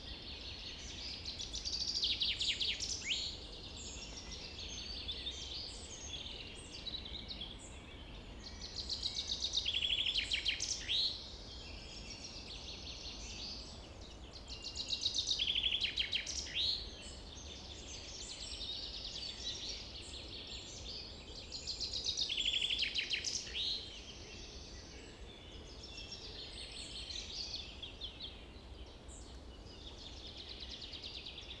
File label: forest